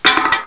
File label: metal hit; goatgame